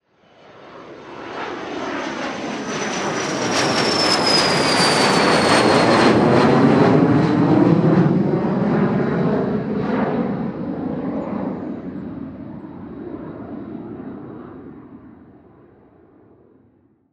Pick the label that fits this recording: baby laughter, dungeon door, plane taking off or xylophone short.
plane taking off